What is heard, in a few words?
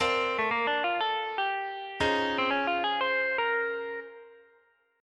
sub dominant min